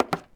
plywood step; Golden Crayon Sounds

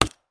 wall wood; Golden Crayon Sounds

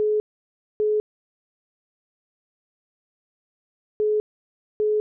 call waiting tone cept; iOS 26 Sounds

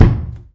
bathtub step; Golden Crayon Sounds

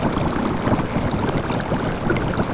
boiling; Golden Crayon Sounds

